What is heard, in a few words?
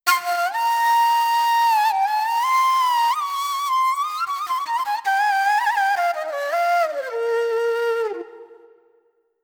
Nay Vibrato